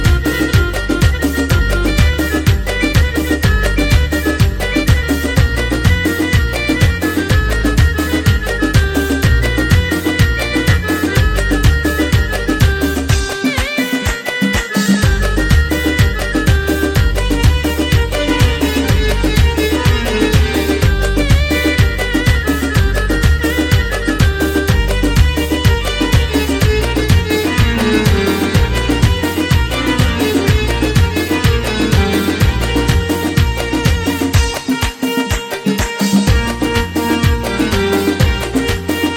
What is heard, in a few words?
Keyboard